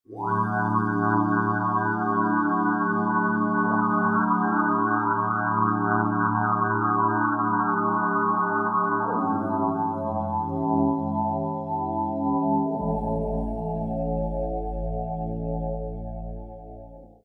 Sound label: Sinister suspense drone